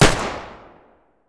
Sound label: sterling fire, weapons; garrysmod